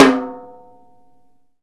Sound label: Pic ff Rim; Digital Sound Factory